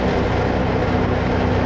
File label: air compress; KINGPIN